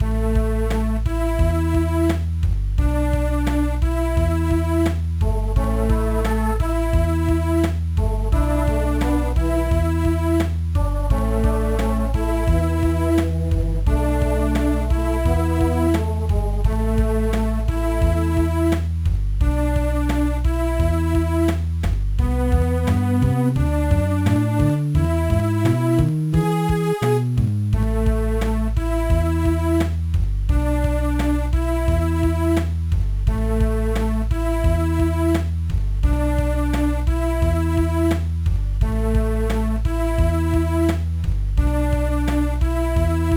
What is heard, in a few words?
BGM; Atomic Betty; GBA